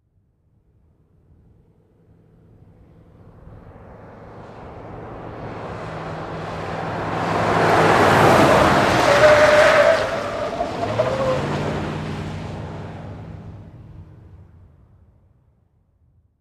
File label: Expedition Fast Corn, ford expedition